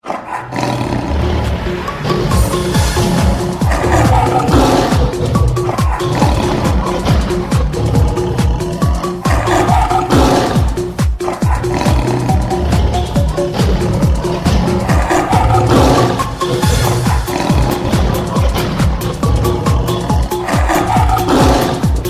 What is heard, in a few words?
best tiger remix